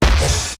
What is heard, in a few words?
giant soldier step; video games; source engine; tf; mvm